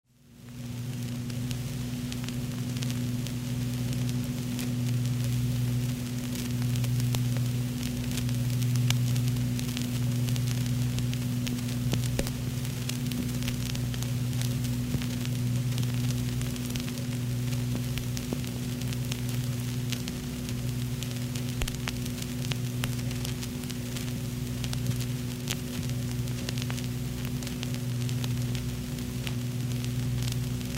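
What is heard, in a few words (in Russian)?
шум пластинки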